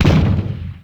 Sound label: Bullet Bill Cannon